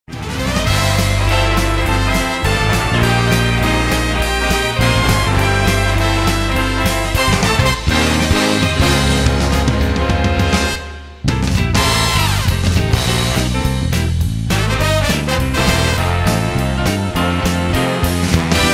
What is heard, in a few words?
Instrumental; SMO